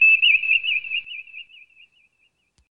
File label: space sonar